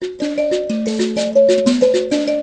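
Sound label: mbira toot; Musical Instruments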